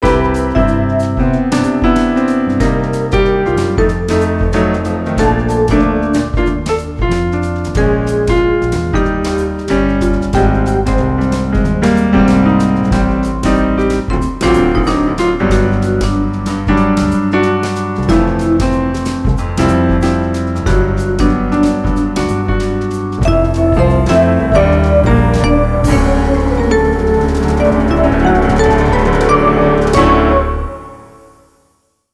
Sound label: Smooth Jazz; the sims jazz lounge